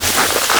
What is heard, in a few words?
frost armor damage; weapons; dota; lich